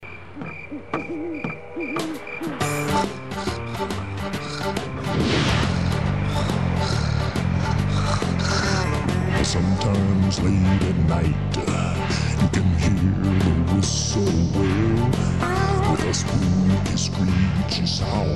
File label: Haunted Train. Mad Engineer's song; heyarnold